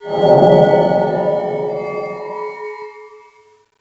reveal

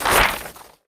makimono out; nahyuta

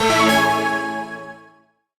blip start

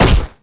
steal; filch

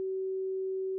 spooky tone

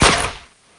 spotlight

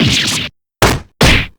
damage

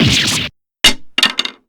damage; Amara; nayna

